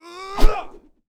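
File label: weapon cavalry javelin; Battle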